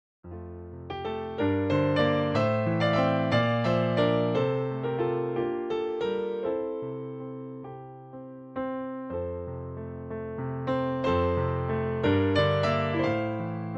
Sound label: Piano